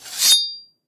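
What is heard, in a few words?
ringing of blade of sword drawn from scabbard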